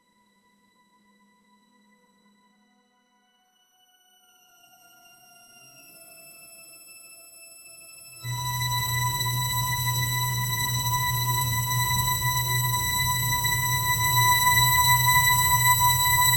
suspense build up sound, score

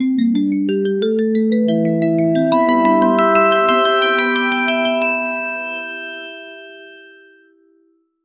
fantasy rpg major scale

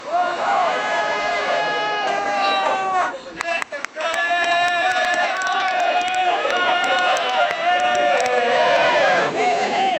create a busy paparazzi, overlapping voices

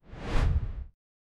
light aircraft whoosh

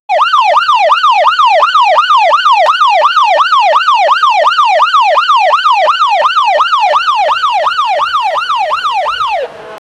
emergency

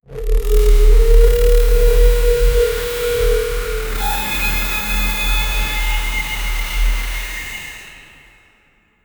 healing sound fx like bio

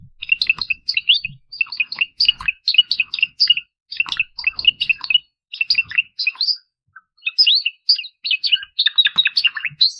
bird hopefully got water

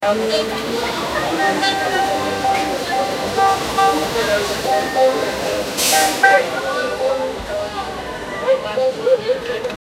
ambient sounds of friendl; market

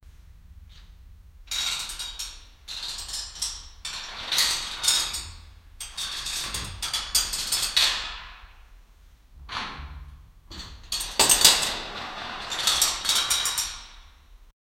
sound of cinema curtains, theater

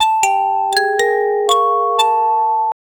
loopable ringtone cute, bamboo

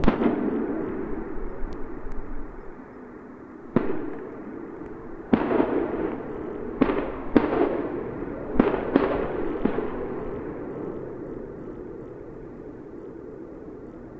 war atmosphere with gunfi, bombing

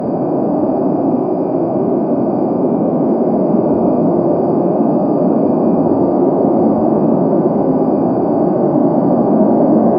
rushing air

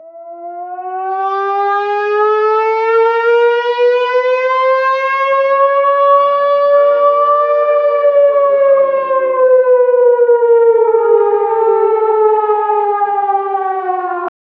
air raid siren

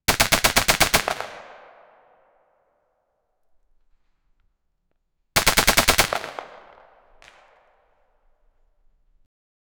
ak 47 rifle shots sharp

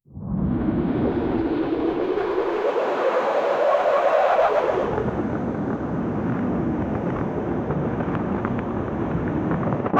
superhero flying sky wind

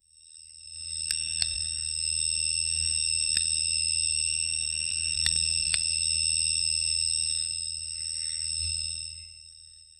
the sound of a small, creatures

